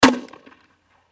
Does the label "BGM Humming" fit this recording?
No